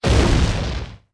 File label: Powder Keg Blow; A Powder Keg exploding